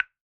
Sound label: Percussive Hit